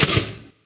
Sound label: Pop; operation mania online